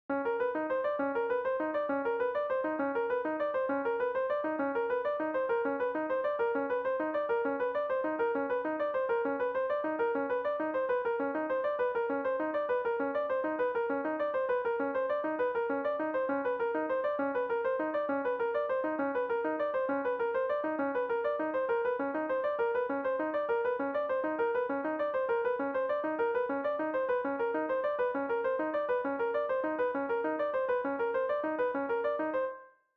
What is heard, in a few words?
Bb Fast; harmonic and melodic equivalence v1